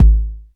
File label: K909, KICKS TR 9+8